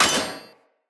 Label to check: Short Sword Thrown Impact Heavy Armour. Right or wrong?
Right